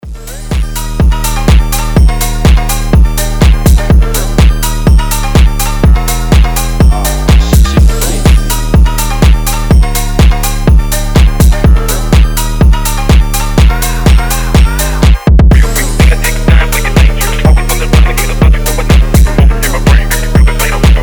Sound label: phonk house